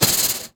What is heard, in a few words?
Steel Brush, Snares; Ghosthack - Free Snares 2023